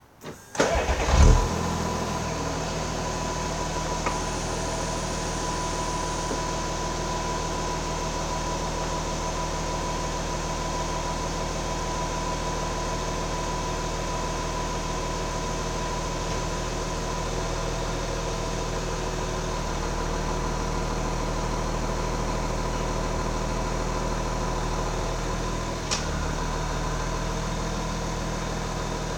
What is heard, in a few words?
Audi A8 diesel